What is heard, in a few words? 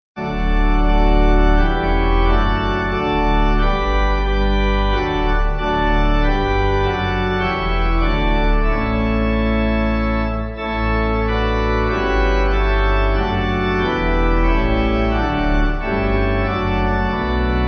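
Pipe, Pipe Organ backing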